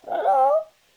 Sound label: Sprout Vocal Grumpy; Friendly; Sproutfolk; Fey Hub Sprout